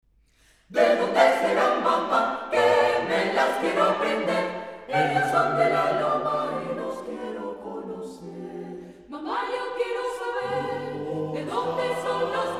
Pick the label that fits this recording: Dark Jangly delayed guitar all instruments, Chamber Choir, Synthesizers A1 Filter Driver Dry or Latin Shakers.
Chamber Choir